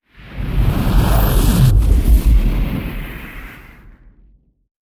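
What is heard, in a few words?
sounds of meteorite asteroid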